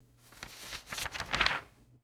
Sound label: crisp magazine page turn